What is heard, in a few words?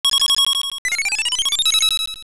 Chest Appear; A treasure chest appearing